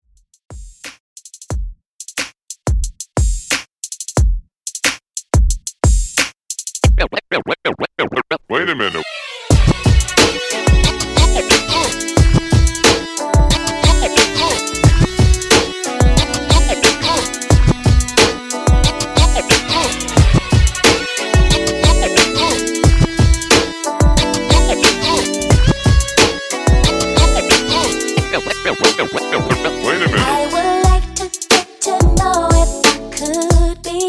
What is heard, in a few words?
90s RnB Redrum 90Bpm